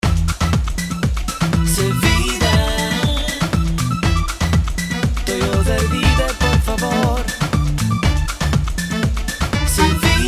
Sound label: Vida Vox; Sony Ericsson; PlusFourSix; Carola (W800)